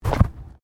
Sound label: Body movement Fall Heavy